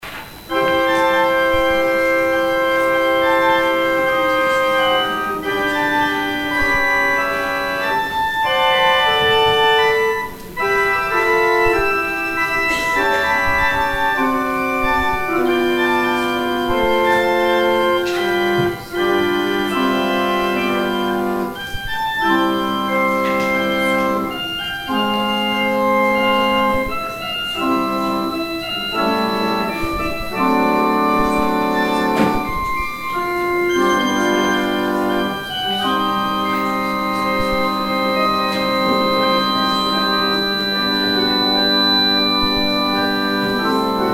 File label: organ interlude